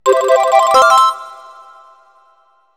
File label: Coins; Nintendo and Rareware; Donkey Kong 64